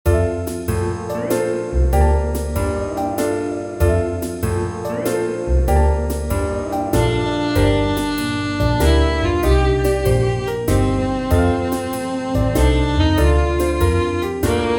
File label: country pop ballad